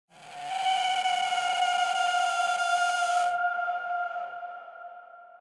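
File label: ambience bad creature screech; smite; conquest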